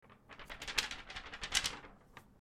Flipping book in wind; ورق خوردن کاغذ کتاب در باد

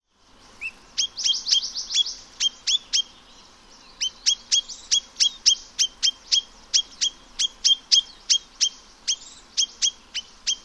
Chinese nuthatch; پرنده کمرکولی چینی

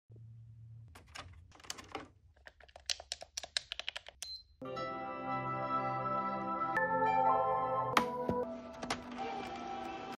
ps4 notification; اعلان پی اس 4

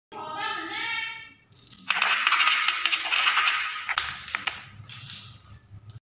Marbles, قل خوردن تیله

